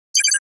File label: computer bleep; NCH Library